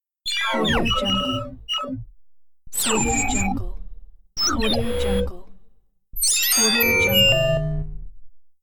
futuristic robot hitech

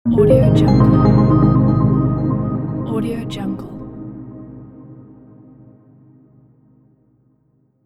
tech brand logo

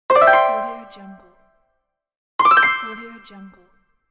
you win; welltone

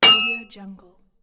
metal hit

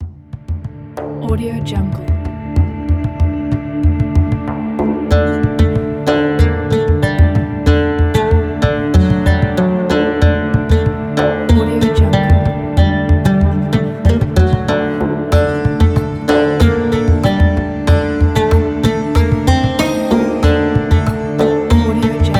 middle east

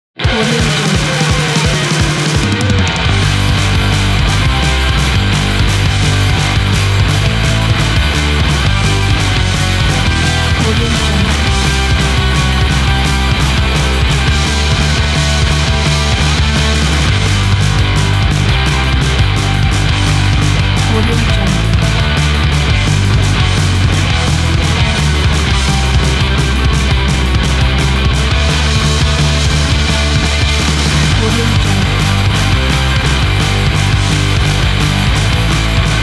short fast punk

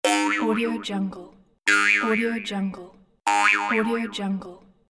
boing

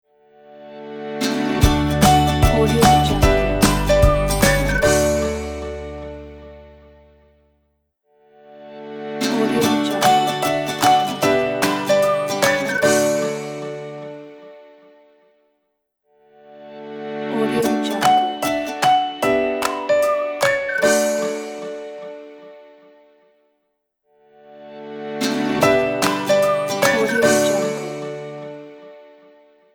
ukulele whistle logo

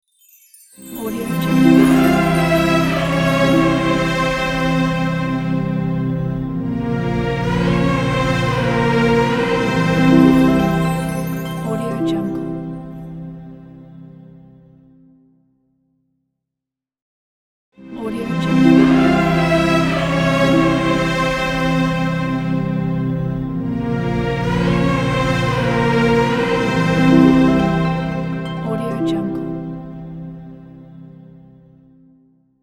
arabic strings logo

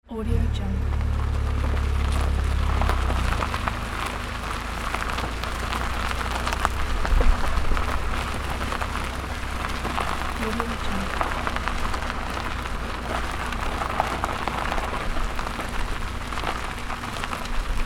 car driving on gravel

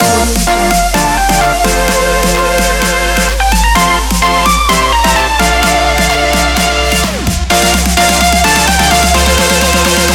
club dance

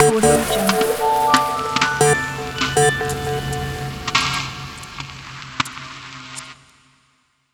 digital wave logo

